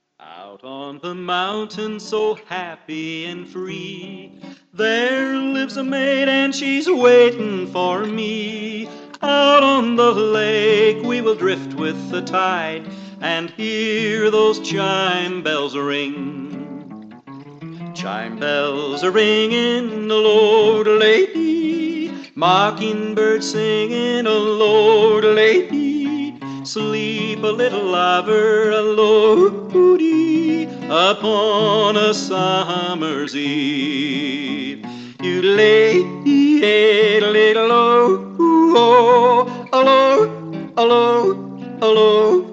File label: chime bells